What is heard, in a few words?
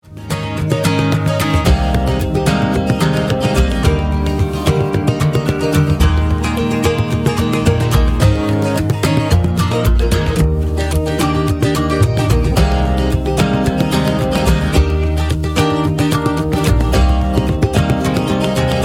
Eb maj